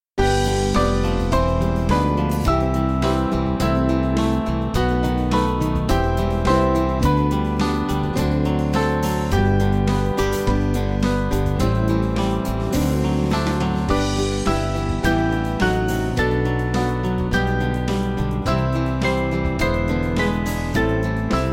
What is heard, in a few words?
Band; Love Came Down; Yuletide